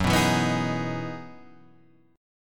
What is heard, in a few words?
E Major 9th F sharp; strummed; JGuitar